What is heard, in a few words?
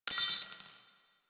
Belt Fed Rifle Links; Weapons; Warframe; Tenno; Frumentarius